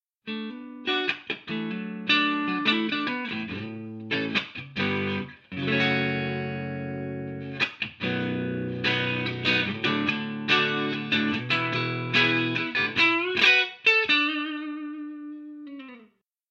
clean

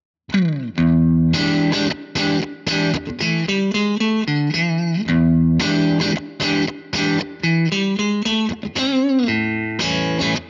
p10r breakup; jensentone

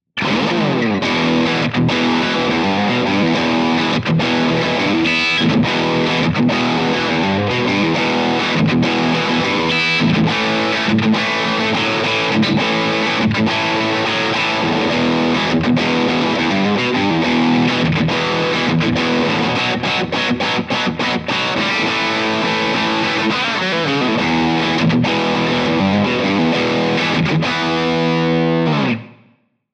characteristic sound; jensentone

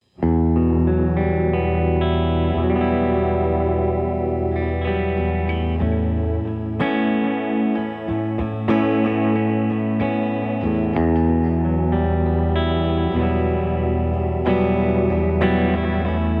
Jensen BB40 CLEAN HB MB CHORDS; jensentone

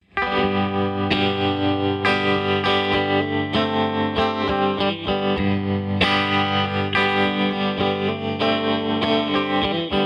Audio Tracks - CLEAN STRAT FP CHORDS; Jensen BB40; jensentone

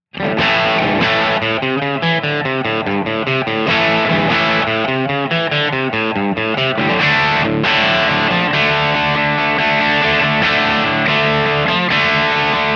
characteristic sound; jensentone

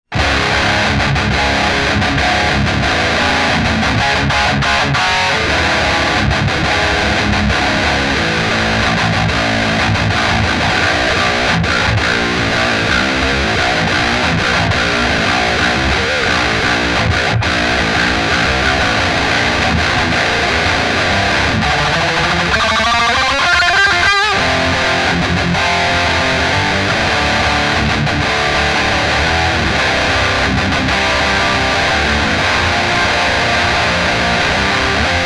metal, Jensen; jensentone

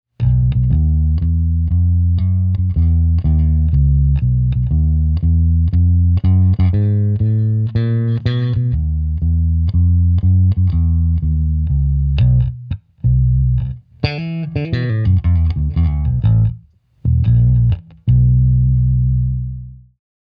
blues walk, Jensen; jensentone

